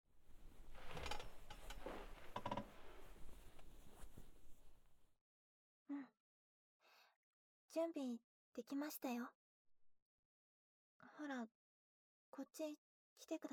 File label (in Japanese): 膝枕で耳かき（右耳）; １年B組・空気晶葉編